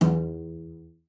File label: CELLO FINGER E2; Xsample VOL-5